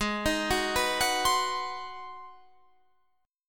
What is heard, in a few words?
G sharp 7th Sharp 9th